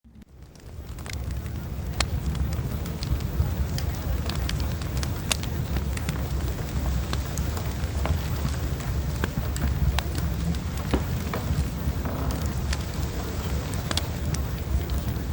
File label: Fireplace